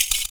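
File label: PERC, percussions; Univers Sons - Raricussions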